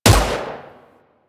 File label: AI Rifle Shot; Warframe; Weapons; Corpus; Crp Ruban Rifle